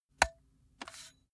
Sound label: metal pole